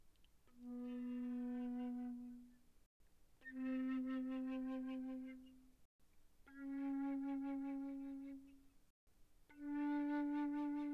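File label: Flute vibrato pp B3 B4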